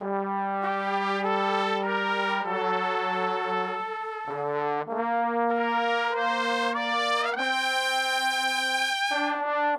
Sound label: Horns Section